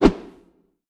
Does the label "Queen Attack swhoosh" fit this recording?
Yes